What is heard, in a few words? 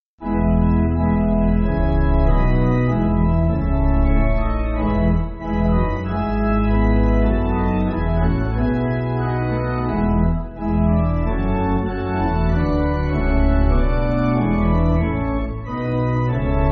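Pipe Organ, church backing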